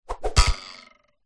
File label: cartoon arrows whizz past hit target with twang